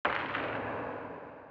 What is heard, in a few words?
ice, ambient soundscapes; dota